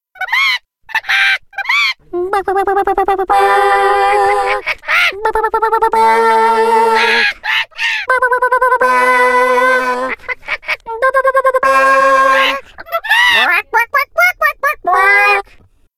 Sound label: Song Bird Warm Up; gamerip; Skylanders 4 Trap Team